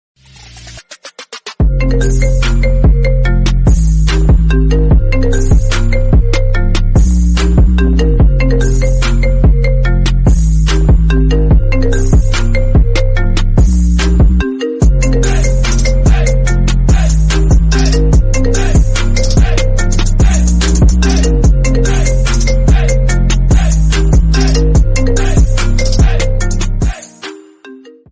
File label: iPhone 14 Max; toque de celular